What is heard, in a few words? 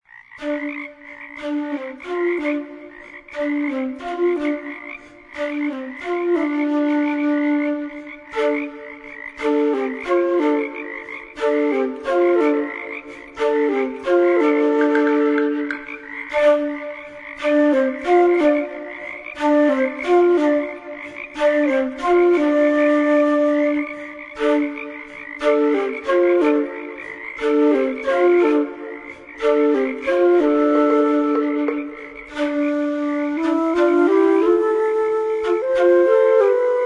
Waltz